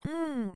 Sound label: achievement low; VIVAE; vocaltrax